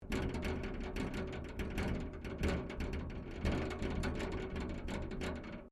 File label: WRECK METAL PLATE RATTLE SEQUENCE, Wrecked Cars; impacts, crashes, scrapes; Crashes (Sound Effects:Objects)